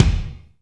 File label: Reverb Kick Snare; PS-37 Dance Synths; Percussion